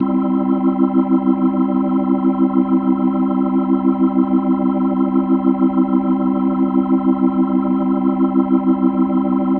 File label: Organ C Maj; Ambient Cinematic; Organ Licks